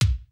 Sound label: block fall; ponponchun